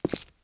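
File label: boot wood walk left, footsteps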